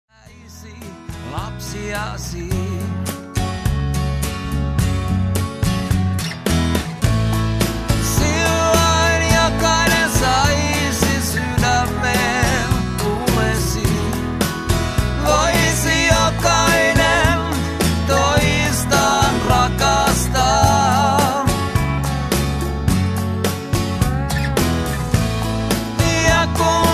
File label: unplugged